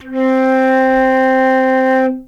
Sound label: flute C octave 0; csound-manual-react